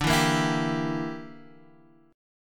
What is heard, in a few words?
A sharp 6th Flat 5th